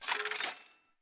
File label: scatter Counter Up; netent